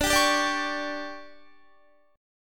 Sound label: D Major 7th Suspended 4th, D, down, Guitar; DM7sus4; strummed; JGuitar